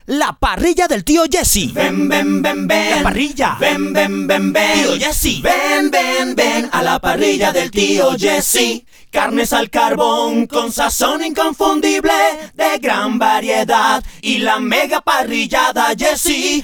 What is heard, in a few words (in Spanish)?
Solo Voces; La Parrilla del Tio Jessy 2014